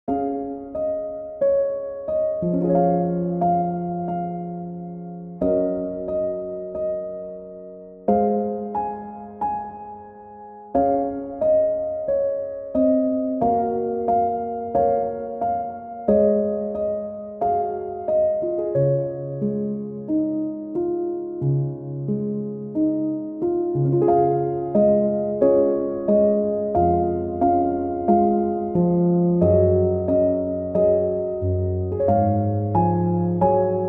Mary Had A Little Lamb (Piano)

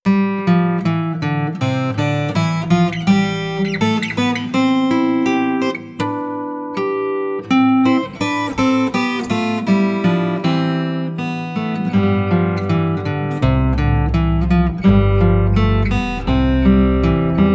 Instrumental

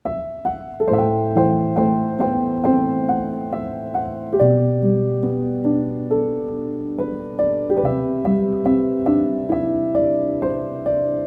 Piano; Kiki's Delivery Service

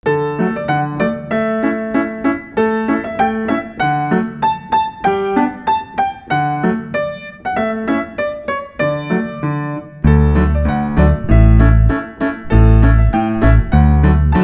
Instrumental

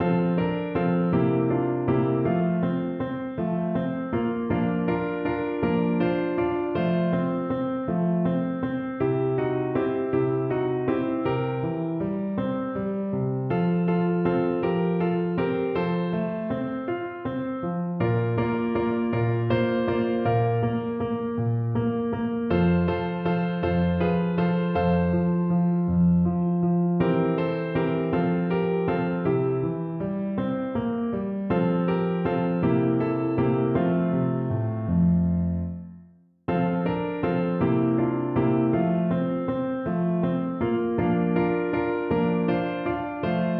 Wiegenlied, Lullaby; Mozart

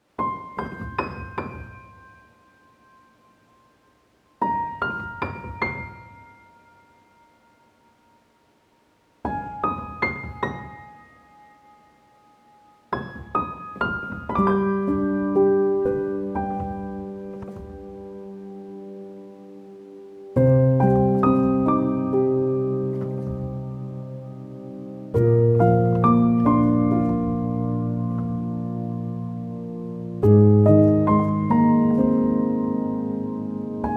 Piano Version; From Up on Poppy Hill

